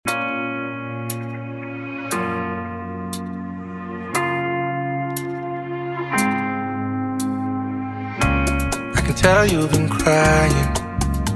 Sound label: Bachata